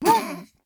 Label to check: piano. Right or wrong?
Wrong